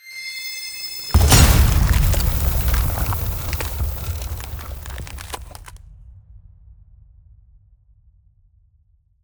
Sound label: Ice Spell Explosion Impact Frostquake; Ice Explosions & Impacts; Arctic Aura